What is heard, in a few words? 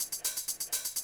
125, Classic Drum Loops